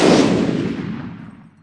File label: Electro FX; Ueb HE Electro FX